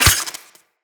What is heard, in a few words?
Melee Dagger Impact Robotic Flesh